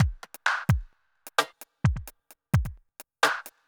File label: dubby house 130